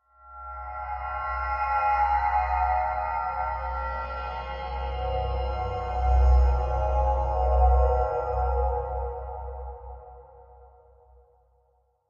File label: Feedback HF Lfe